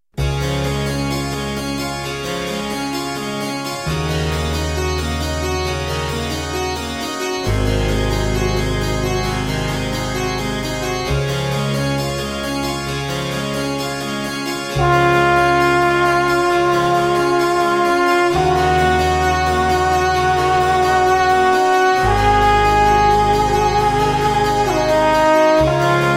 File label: big band; ave maria